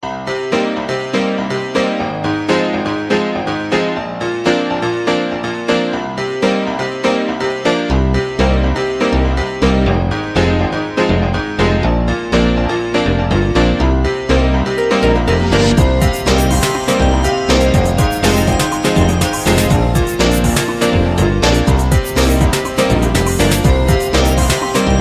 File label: ringtone new age fantasy